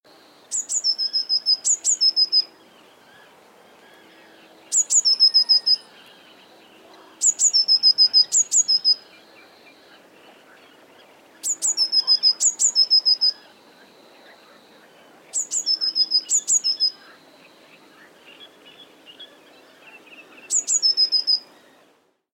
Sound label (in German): Blaumeise Gesang